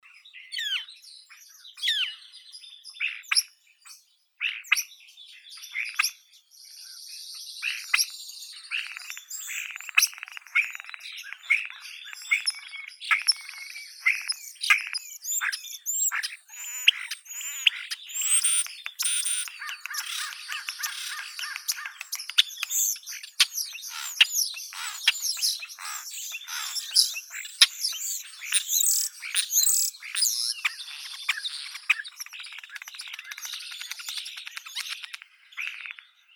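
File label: common starling sturnus vulgaris; skvorets obiknovennii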